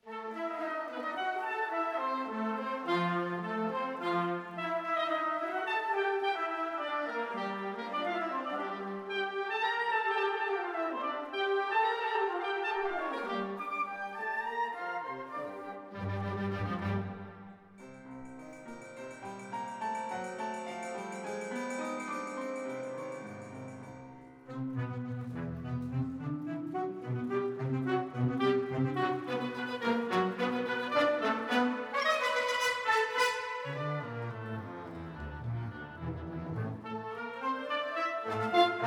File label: Symphonic Band; Alabama Symphonic Band